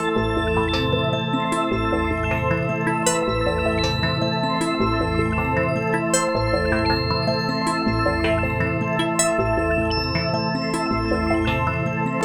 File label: bellpeggio; Loops; Electronic